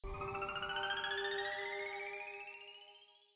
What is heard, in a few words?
space music